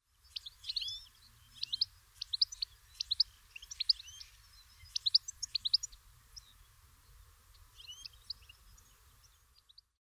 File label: goldfinch